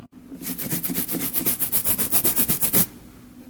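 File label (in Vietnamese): Tiếng cọ xát mài đồ vật ngắn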